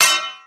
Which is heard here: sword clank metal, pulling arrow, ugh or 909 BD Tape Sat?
sword clank metal